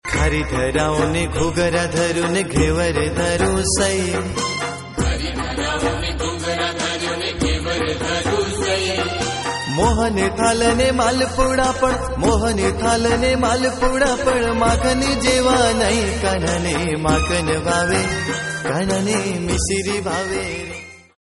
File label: Devotional